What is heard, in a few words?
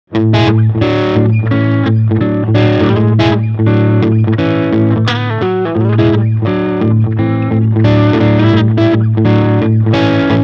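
mild distortion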